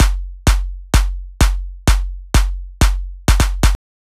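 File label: bass drum G, 128 BPM; VEC5 Drumloops; VEC5 Multi Drum Loop 28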